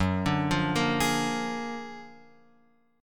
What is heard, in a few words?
F sharp Augmented Major 9th F sharp Guitar Open F; arpeggiated; JGuitar